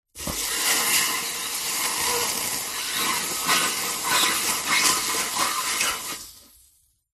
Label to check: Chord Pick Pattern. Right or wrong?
Wrong